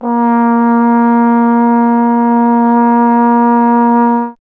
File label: Trombone A#; Miroslav Vitous - Solo Instruments VOL-1